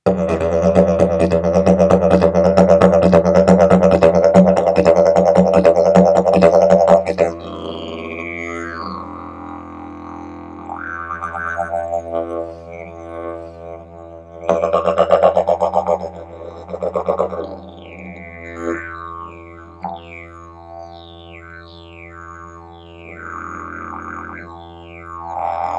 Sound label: didge